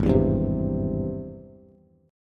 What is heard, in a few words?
Ab Augmented Major 7th Ab Bass; strummed; 4,7,5,5; Ab+M7; JGuitar